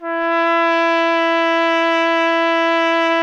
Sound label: BRS TRUMPETS, Roland LA